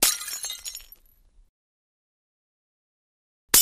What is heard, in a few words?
Glass Jar ( 1 2 Full ) Bottle Breaks; Shards Settling To Still.